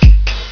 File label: BLOW BLOW; psion cd vol1